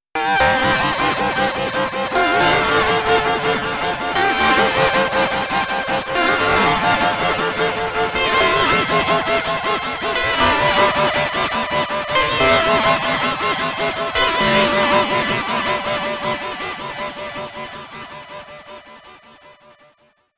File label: Panned Ringmod; Sonicprojects OP X PRO 3